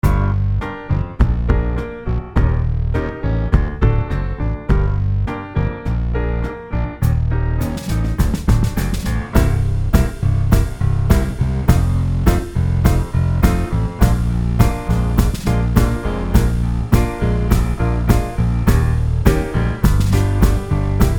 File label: Trombone Mute